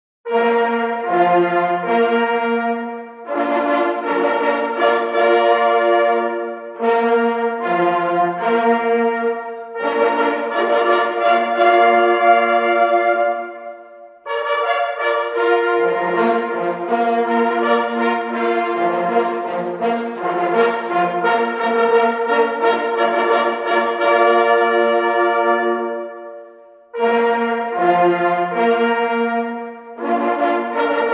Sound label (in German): hundenfanfare